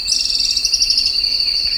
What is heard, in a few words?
Pogonotriccus eximius call